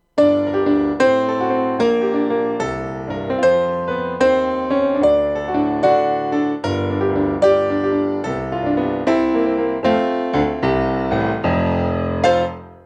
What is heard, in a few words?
song march piano